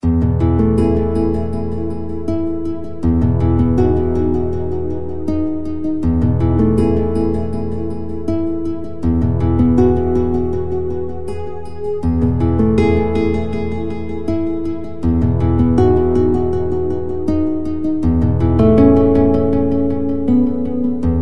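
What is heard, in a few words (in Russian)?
calming melody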